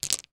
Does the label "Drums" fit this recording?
No